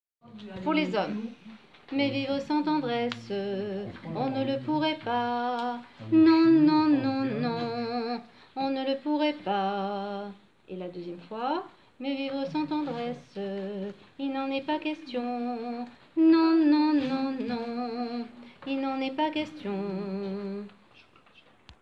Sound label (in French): Basse